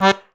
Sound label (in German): AKK VIOLSK, akkordeon; Best Service; Alpine Volksmusik